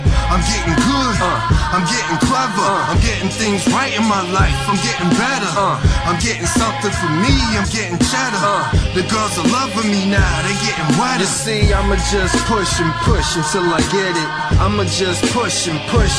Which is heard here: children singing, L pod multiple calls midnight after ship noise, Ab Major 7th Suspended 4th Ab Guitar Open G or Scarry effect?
children singing